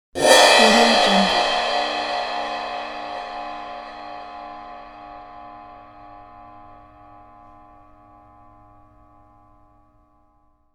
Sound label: cymbal crash scrape